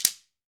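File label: GHANA shaker, African Perc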